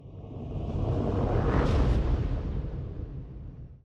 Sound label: whoosh